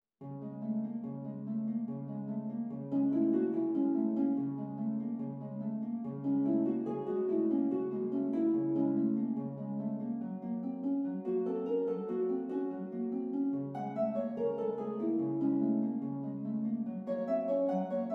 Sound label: Harp beat